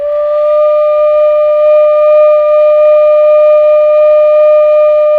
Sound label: KHLUI D4, KHLUI FLUTE; Spectrasonics; Heart of Asia